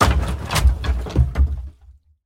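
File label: strong destruction of wooden structure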